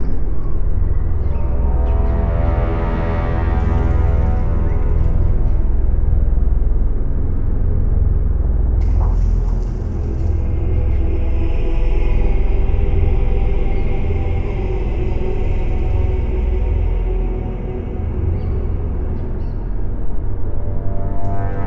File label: Level Catacomb; Dungeon Hunter; android game music